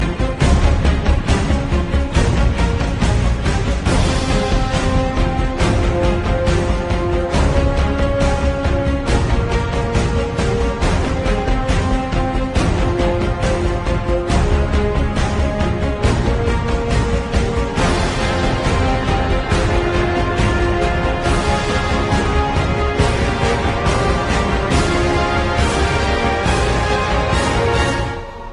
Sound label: Epic